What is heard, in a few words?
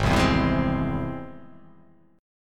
G sharp Augmented; 4,3,2,5,4,3,2,x